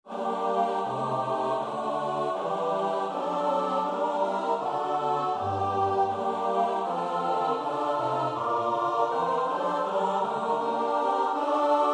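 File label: soprano alto tenor bass; Bach (Johann Sebastiaan); BWV 048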